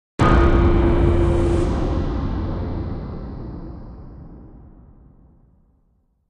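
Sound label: Impact Metallic Chime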